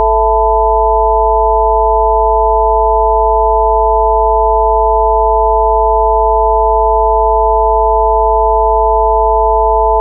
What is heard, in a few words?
sine waves